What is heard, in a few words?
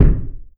DULL, Anime Sound FX; AKAI Anime Sound FX